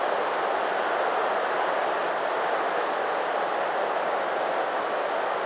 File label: Crowd Neg; Rugby; PS2